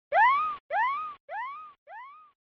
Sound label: Fighting Scream; SNES